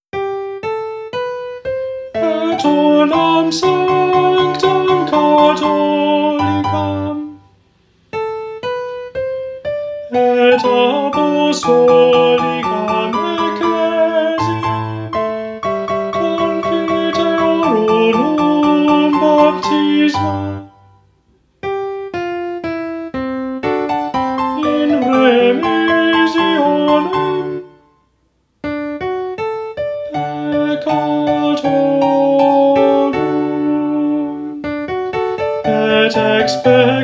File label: Tenor to end